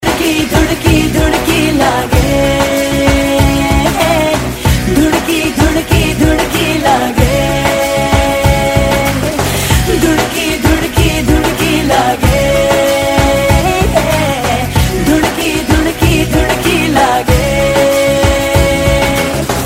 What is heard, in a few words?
dhun ringtone